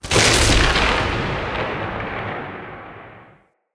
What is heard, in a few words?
Tesla Mini Game Shot; Encounters; Ode Pollution Tower